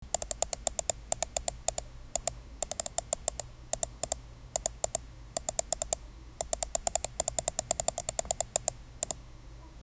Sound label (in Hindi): chuhaa ki bolne ki aawaj, rodent